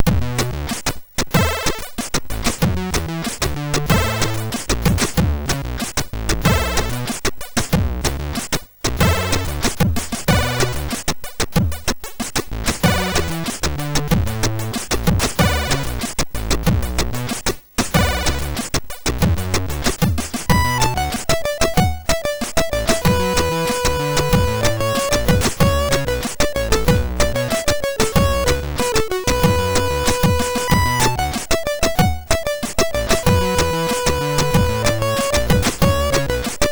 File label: MOS6581R2, SID